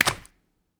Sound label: Step Flesh